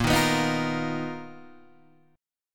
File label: B Major Sharp 11th A sharp